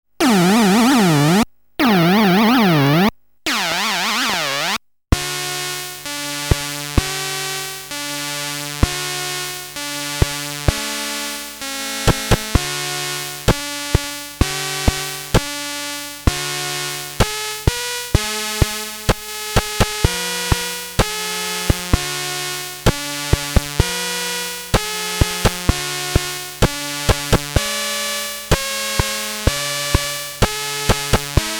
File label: single jingle